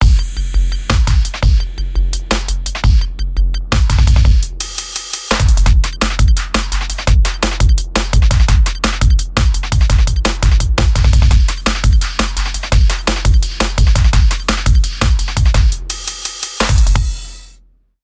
DNB 170

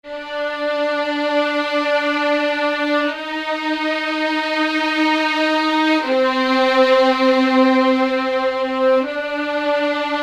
160 Gm Vlns Pad

